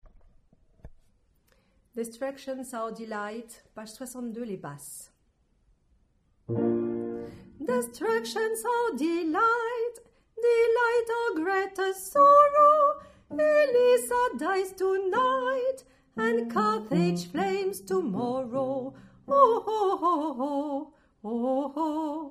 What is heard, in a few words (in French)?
basse